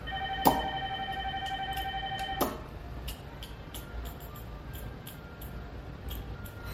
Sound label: beats